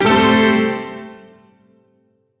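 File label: Shawzin Major Chord; Warframe; Duviri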